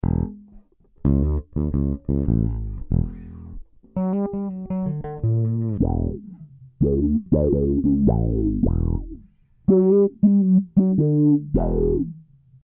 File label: bass